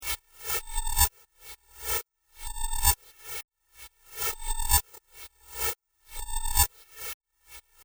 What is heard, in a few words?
square beat